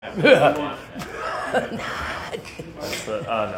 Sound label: scary laughter